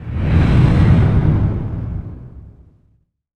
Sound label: IMP FLAME, Cinematic Impact